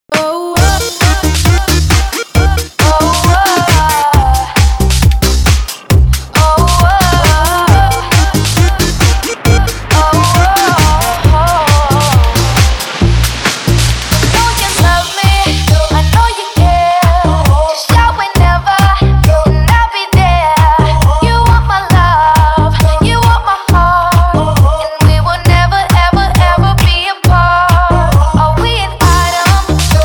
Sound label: baby remix